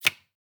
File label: card; pelmanism